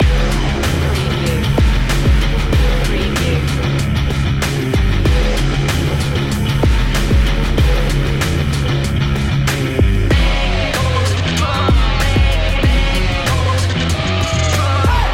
bang the bass loop

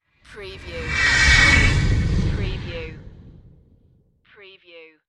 Spaceship Pass By; Sound Effects Bible

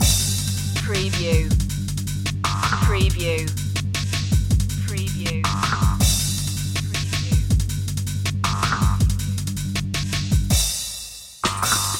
shock load loop

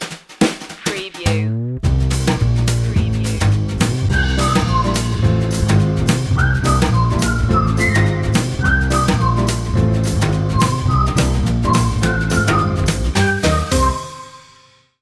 magic whistle

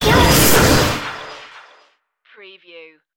wobbling space turbulence

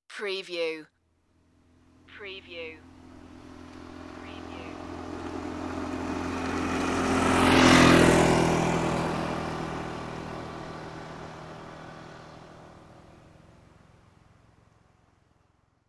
scooter passing

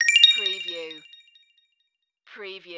Digital Chime Sparkly Alert

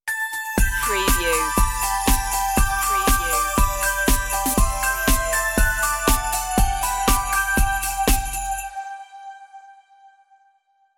merry christmas synthified stinger intro

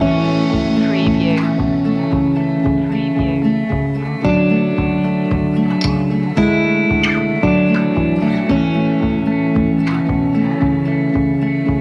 walking home loop

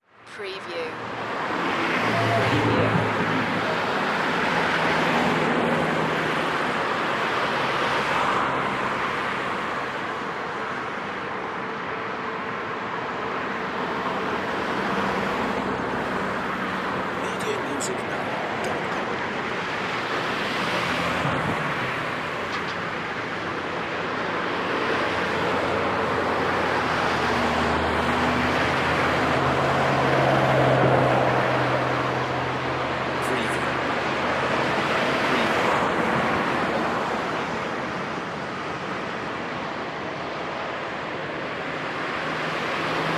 Night Highway Traffic

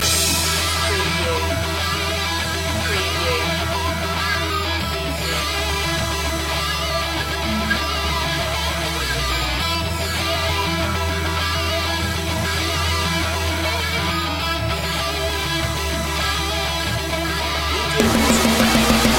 beat boy loop

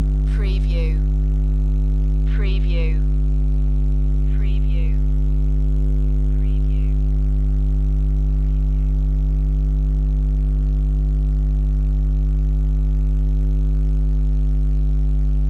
Electric Hum Static

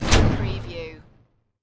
Hit Warp

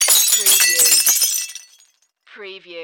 Dishes Break

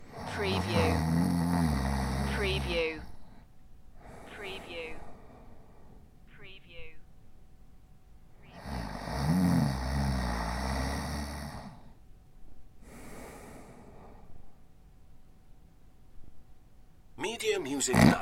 Snoring, Male Snore Six Times

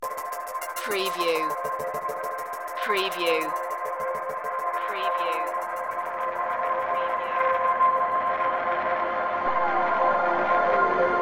teleportation underscore

